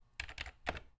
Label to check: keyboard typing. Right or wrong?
Right